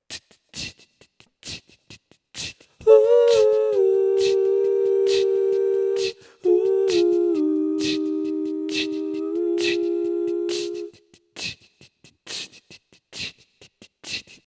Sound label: phrase loop